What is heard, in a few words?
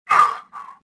pain; Star Wars Jedi Knight II Jedi Outcast; XBOX; shadow